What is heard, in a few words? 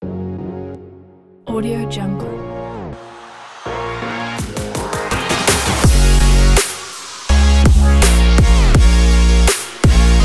trendy modern video ads music